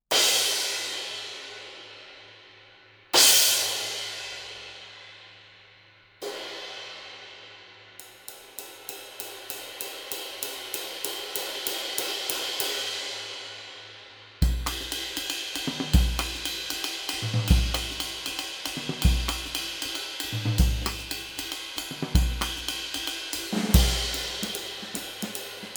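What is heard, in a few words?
Sabian Proto 20 Artisan, cymbal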